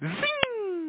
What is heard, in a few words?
zoom